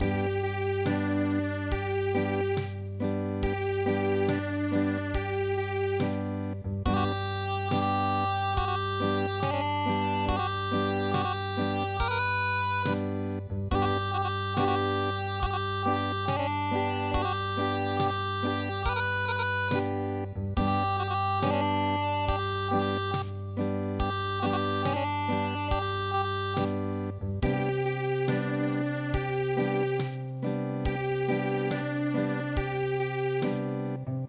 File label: Acoustic, midi traditional